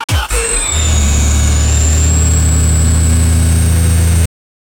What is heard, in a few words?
startup v8 engine